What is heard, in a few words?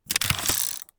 bird arrow load